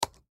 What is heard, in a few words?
Laptop Type Single Key; Laptop Computer; Computers (Sound Effects:Electronics)